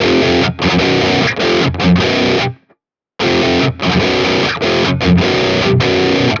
Metal Guitar Loop, 150 BPM F Maj; Cymatics; Vibrations Guitar Sample Pack

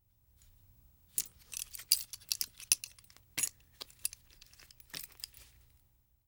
Metal Clip Movement; Fairlight Sound Lib

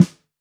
Brass, snare; Drums; Ghosthack - Free Snares 2023; Blk Brass

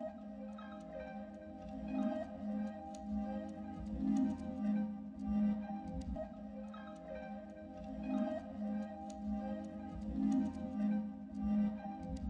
156 BPM F Min Arp, loop stems; Cymatics; Intergalactic; 2022 Melody Collection Platinum Expansion

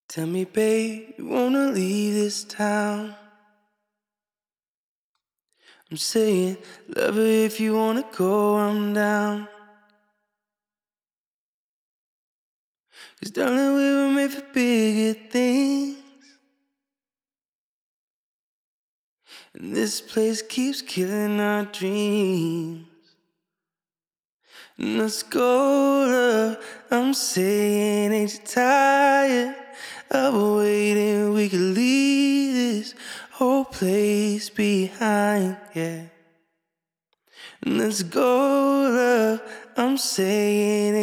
Acapella Wet - Leave This Place - 150 BPM F# Min; Cymatics; Euphoria Vocal Sample Pack

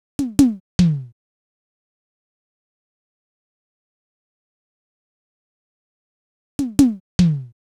Nostalgia Drum Loop 150 BPM Percussion; Cymatics - ANALOG EVOLUTION Beta

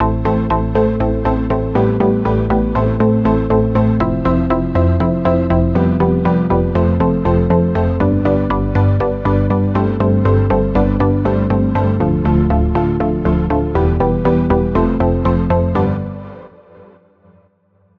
Synth Am 120Bpm, House; Musical Loops; House Kit; Ghosthack; Advent Calendar

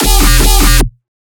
Bass Hits, Dubstep; Advent Calendar 2017 Day 21 - DubstepBass

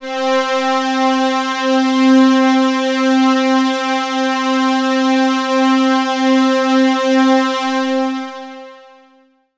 Oberheim OB-X STRINGS Pulse Saw - C; One Shots; Cymatics; Fantasy Synth Sample Pack

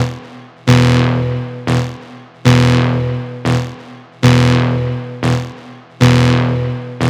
Synth Loop E 135, Techno; Ghosthack; AC2024 - Day 1 - Techno Sounds 2025

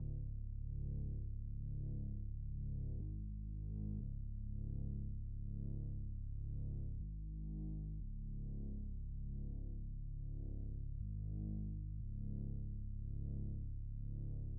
120 BPM C# Min Bass; Loop Stems; Cymatics; Cymatics Gems Vol 4; Death Race

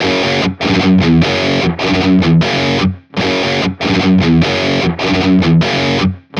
Metal Guitar Loop, 150 BPM F# Maj; Cymatics; Vibrations Guitar Sample Pack

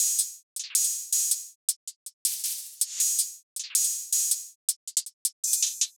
Digi Hihat Loop 160 BPM, Experimental; Hihat Loops; Cymatics; GHOST (Beta Pack)

